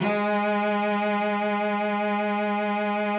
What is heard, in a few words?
G#3, cello vib; Xsample VOL-7